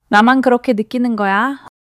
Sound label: laughter